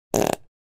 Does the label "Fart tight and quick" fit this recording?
Yes